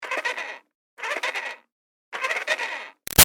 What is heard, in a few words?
Toy Bounce Back Racer Med Far Revs Short, toy car; Toy Cars; Toys